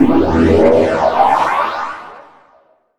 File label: weapon fire